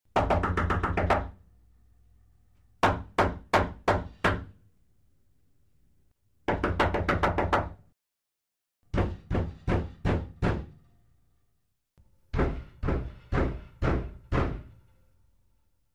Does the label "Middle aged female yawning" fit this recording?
No